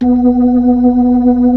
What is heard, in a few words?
B-3